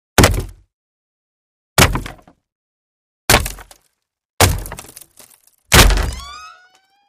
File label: Battering Ram Wood Do